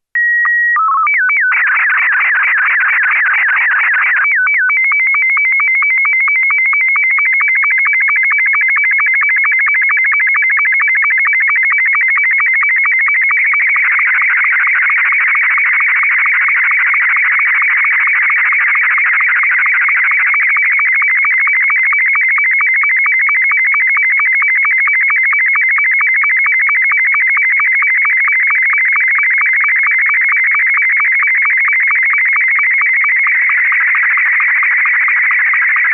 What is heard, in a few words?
sstv image martin2; essexham